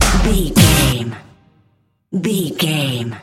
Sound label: sting, Dance, EDM, Club, Disco, Eurodance, Confident, Energetic, Cheerful; Exercise, Aerobic, Aerobics, Spinning, Workout